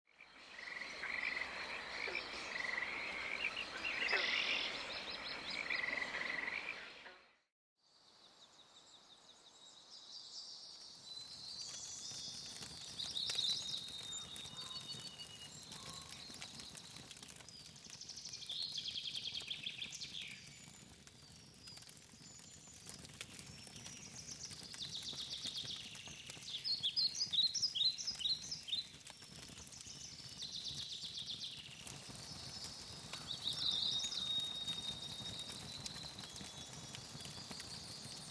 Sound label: Ambient; Age of Empires III; cinematics